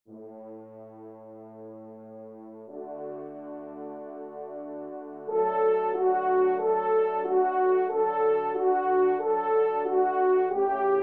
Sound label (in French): trompe; Les Ondes Merveilleuses